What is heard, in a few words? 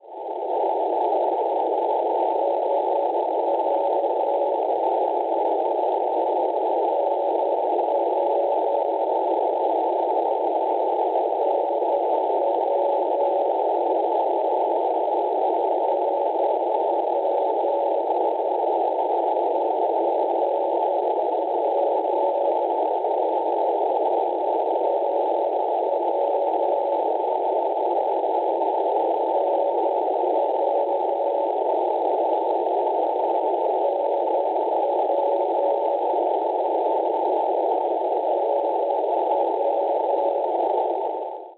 NDB, 520 kHz; NW; Leczyca; Polonia; Schwarzsee